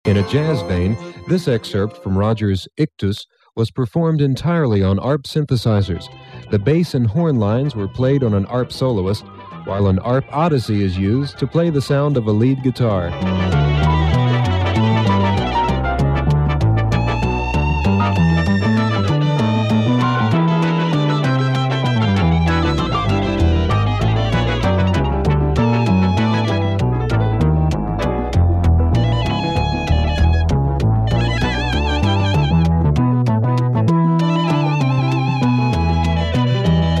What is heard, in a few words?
ARP; ARP 2600 Demo Tape - Powell 2